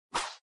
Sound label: character Appear